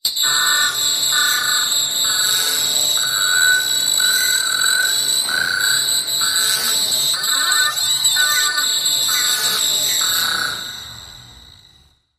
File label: Apocalypse Warning Signal